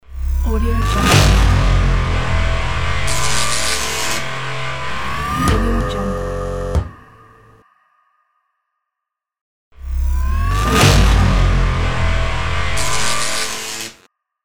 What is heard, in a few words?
a glitch logo; fox-production